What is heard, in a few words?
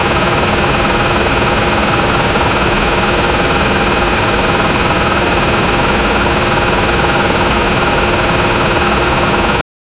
running engine, tank